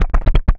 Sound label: Guitar Stop Noise